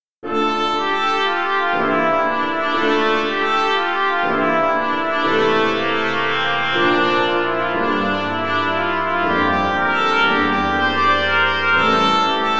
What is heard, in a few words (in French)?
oriental, trompette